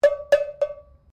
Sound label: Bullock Bell Forged 1800s Ringing, Old cowbells from 1800's; Musical